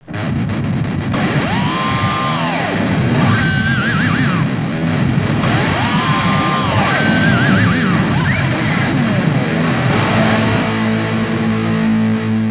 suonerie musica rock

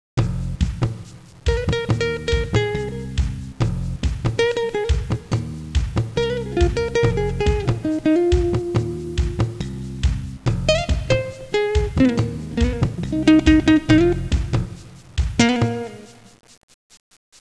suonerie musica jazz

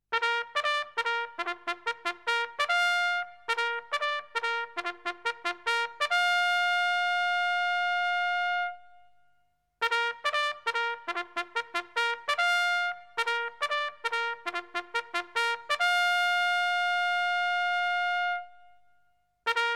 suonerie tromba militare

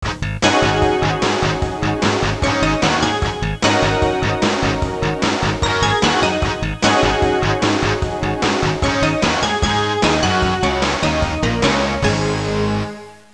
suonerie musica rock

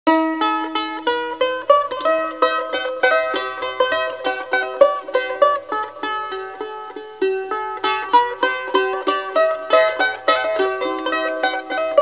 musica strumentale

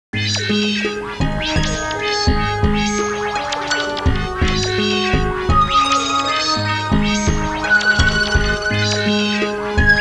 musica elettronica; electronic

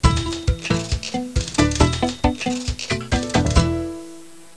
suonerie musica jazz

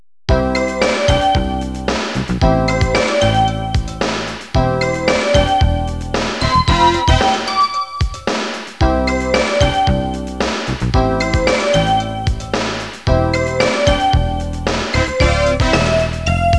suonerie businessy

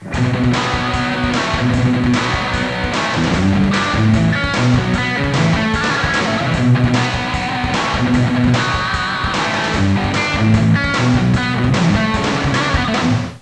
suonerie musica rock

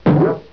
Boing Basso, cartoons

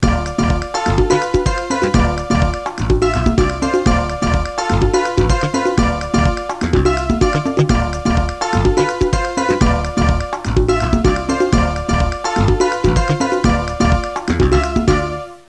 suonerie contemporanea; musica contemporary

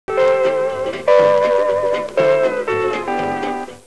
musica strumentale

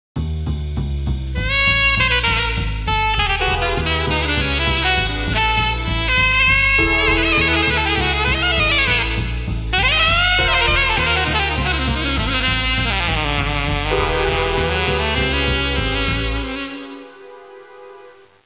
suonerie musica jazz

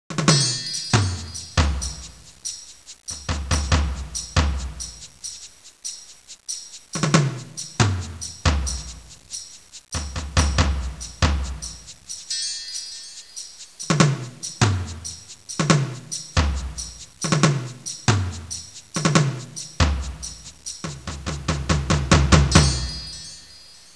suonerie musica ritmica

